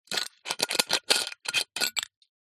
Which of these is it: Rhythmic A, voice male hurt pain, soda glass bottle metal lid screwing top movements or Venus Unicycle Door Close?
soda glass bottle metal lid screwing top movements